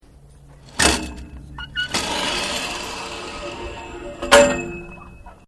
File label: Flying fox Terminal Return To Start Short, Playground equipment; Sports Sound Effects; Sports (Sound Effects:Backgrounds)